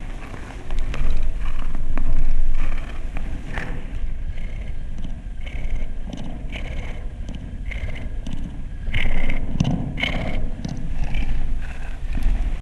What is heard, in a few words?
catnap purring; nightmare criters